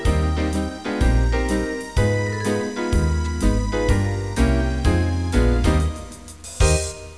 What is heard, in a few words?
jazz; QChord